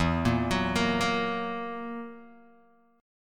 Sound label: E Suspended 2nd Flat 5th E down Guitar Open D